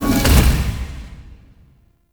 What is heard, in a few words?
Interior Orbital Station Door Small Open; Warframe; Tau